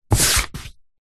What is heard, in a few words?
paper sheet a4 push by hand on table; zapsplat